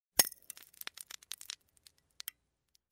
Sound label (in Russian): zvuk camera